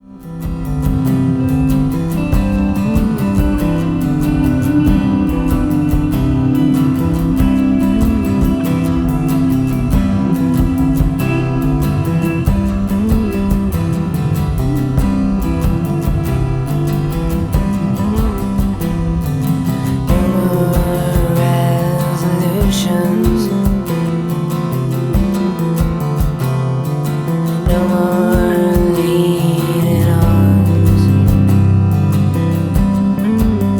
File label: sci fi lullaby